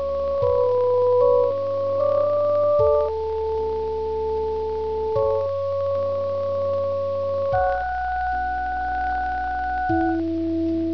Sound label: lofi 8bit